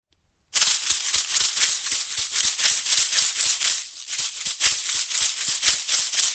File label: maracas